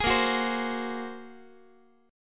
Bb 7th Suspended 2nd Sharp 5th Bb, Guitar 4A 4E 5A 5D 5G sharp 6C sharp; Bb7sus2#5; strummed; x,x,11,8,10,11; JGuitar